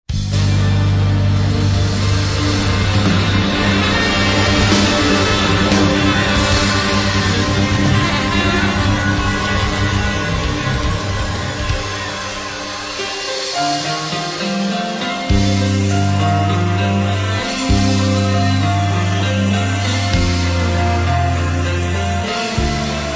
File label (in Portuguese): Introducao Instrumental